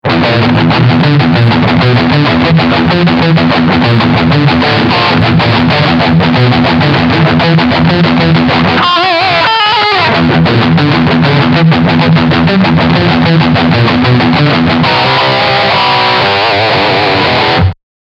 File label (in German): Riff Steg Humbucker